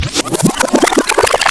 Spin Back whizz; Urban Shakedown

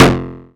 bass stabs, Drum Machines FutureRetro FR777

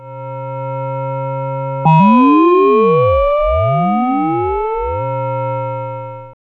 OSC FX, OSCILLATOR FX; Zero-G Ambient 1

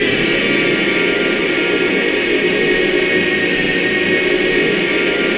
clusters, voices

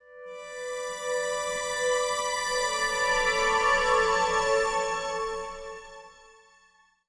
Acoustic Drone, Long Loops; Sonic Foundry (Sony Creative Software) - Friendly Fire; DroneLoop

